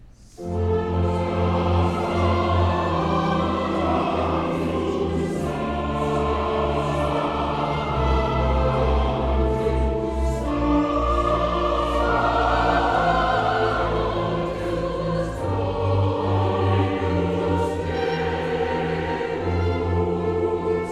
Chancel Choir Missa brevis in F, K. 192 IV. Sanctus; W.A. Mozart